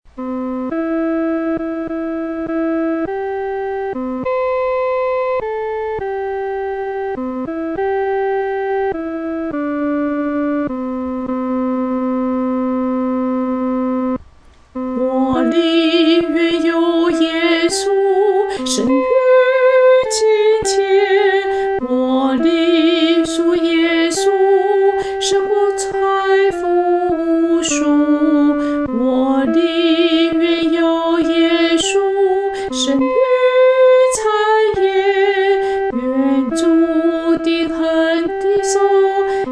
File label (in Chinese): 独唱 第一声